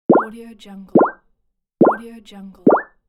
game countdown

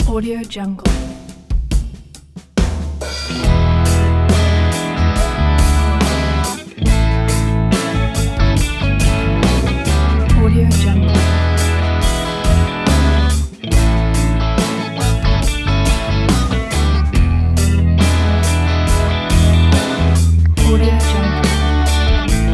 vintage slow rock; swanmusicstudio

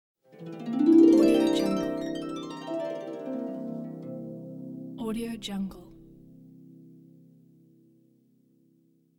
harp gliss up down

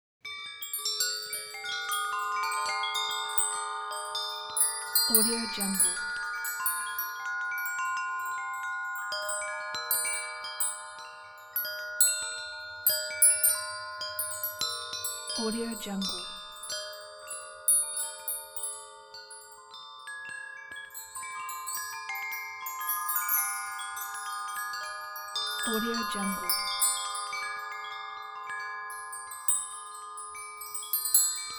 mystical multiple wind chimes; timdonovan

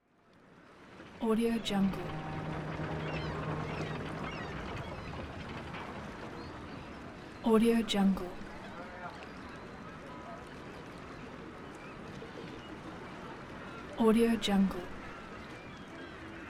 harbour ambience

